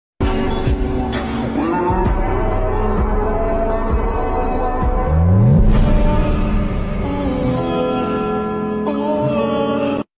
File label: sad alone emotion bgm